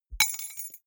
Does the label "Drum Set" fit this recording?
No